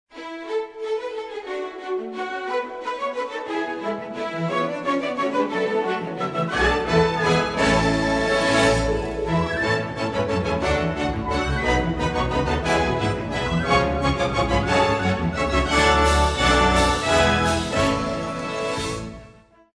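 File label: Orchestra We Wish Merry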